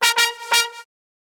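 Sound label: brass, Disco; Best Service ProSamples vol.48 - Disco Fever; AKAI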